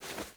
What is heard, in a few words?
Movement Foley K; Wisp